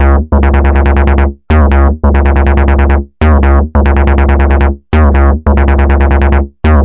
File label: 140 rebirth acid bass, BASS LOOPS